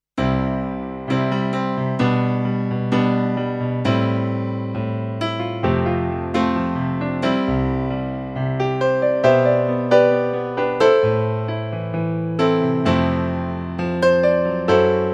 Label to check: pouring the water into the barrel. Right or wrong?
Wrong